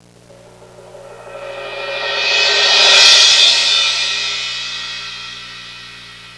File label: Crescend